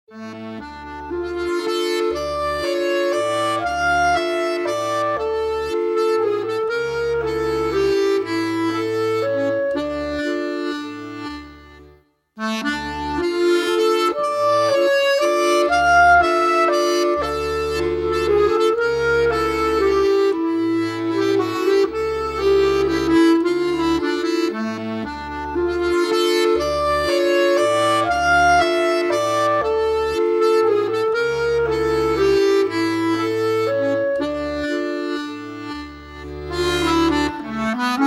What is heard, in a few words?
accordeon